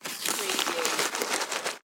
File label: Bag Paper Hand Reach; sound effects bible